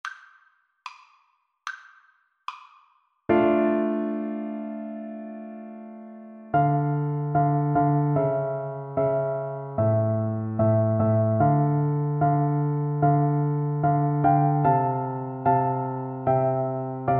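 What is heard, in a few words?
recorder karaoke; beethoven 7 2nd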